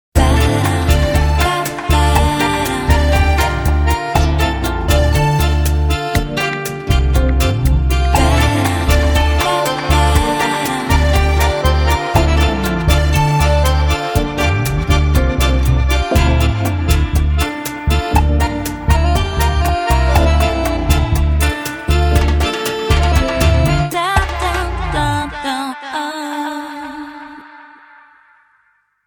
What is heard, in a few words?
TANGO RAIN